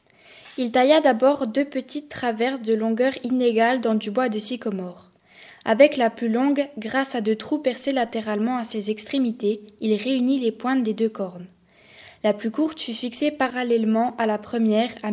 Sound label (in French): Harpe éolienne